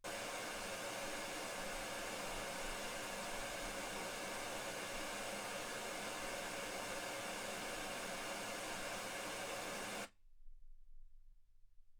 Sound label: wgn